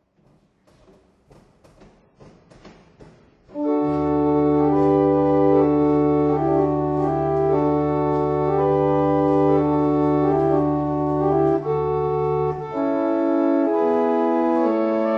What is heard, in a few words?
barrel organ; Letheringsett